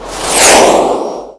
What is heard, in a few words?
Jet Fly By; Playable Tutorial Level; TR3